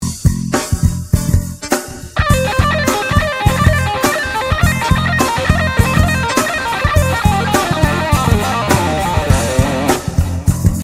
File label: Lick